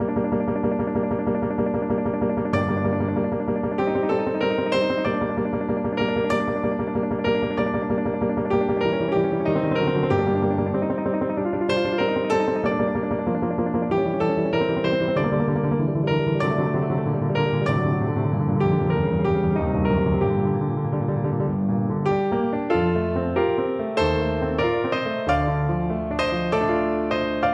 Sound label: scene finale piano duet